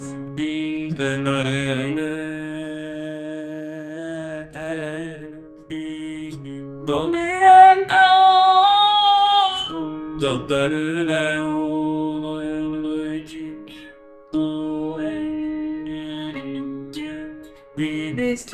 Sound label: a slightly drunk man in, human vocalizations